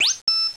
store item; superbroswar

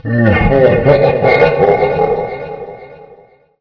laugh; hurrican

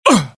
death, pain; mohaa; dialogue

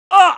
damage, pain; mohaa; dialogue

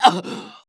death; mohaa; dialogue

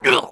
damage; mohaa; dialogue

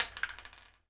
pl shell; xash3d fwgs

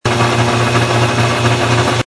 Electric Cart Idle; vehicle; mohaa

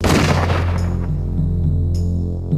death; singe

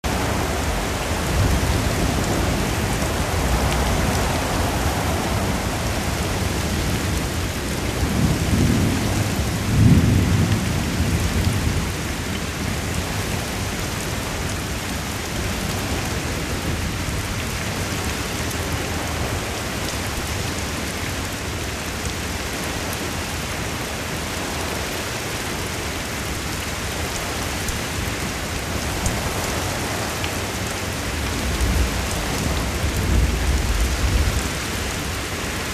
Amb Rain Ext; mohaa

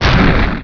fireball; hurrican

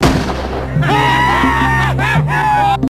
death; singe

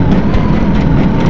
washer, ambience; xash3d fwgs

